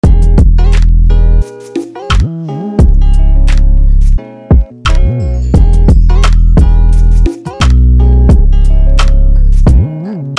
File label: Instrumental Freestyle beat